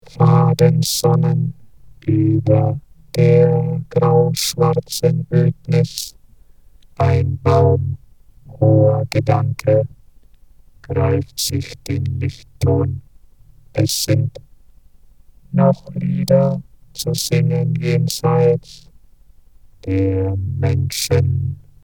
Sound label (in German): Orgel